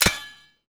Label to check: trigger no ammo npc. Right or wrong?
Right